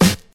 deep hip hop snare f sharp key

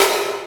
snare drum sound g sharp key; snare drum one shots

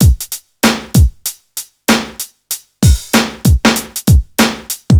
96 bpm drum loop c sharp key

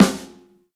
reverb steel snare drum sample g key

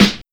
good snare drum sound g sharp key

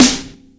small reverb steel snare drum sample c key

